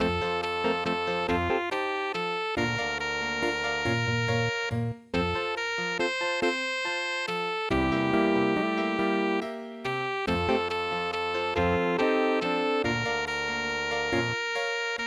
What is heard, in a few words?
jazz waltz; Beautiful Brown Eyes